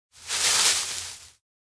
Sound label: tree sway; anemoi